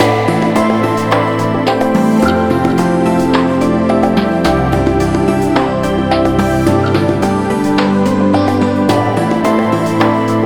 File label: Church BGM; Bramunez; rusty hearts